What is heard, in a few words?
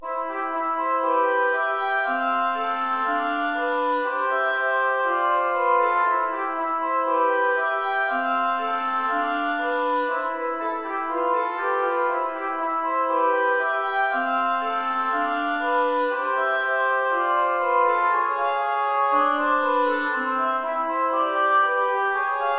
Etude for 2 Oboes